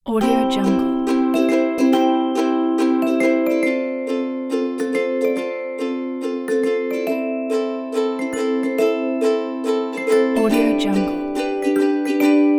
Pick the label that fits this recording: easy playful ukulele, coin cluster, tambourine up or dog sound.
easy playful ukulele